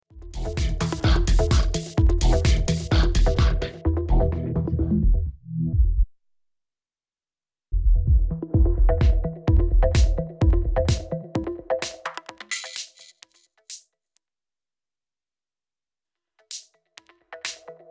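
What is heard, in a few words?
Denon DJ X1800 Filter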